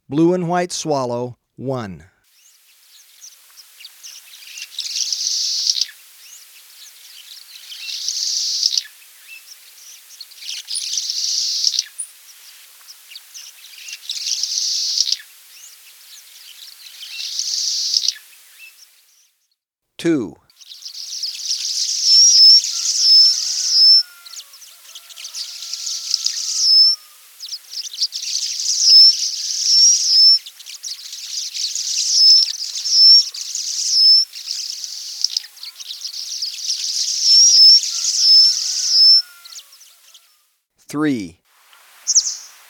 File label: Blue-And-White Swallow, Birds of Ecuador; S. America